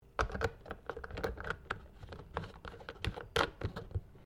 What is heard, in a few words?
Carton cardboard cap plastic screw on, milk or juice container; Food; Household